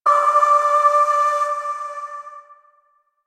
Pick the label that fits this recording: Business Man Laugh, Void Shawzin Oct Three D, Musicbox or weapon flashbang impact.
Void Shawzin Oct Three D